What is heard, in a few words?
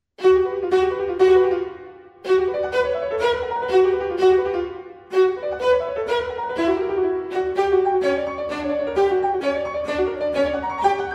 trio; juon